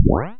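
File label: Weapon collect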